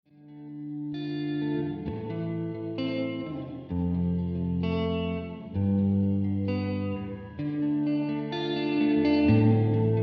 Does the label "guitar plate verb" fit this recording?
Yes